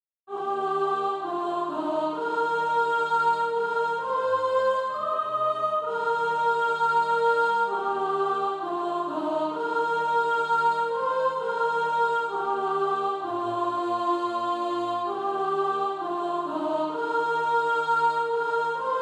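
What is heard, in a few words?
soprano, Practise Music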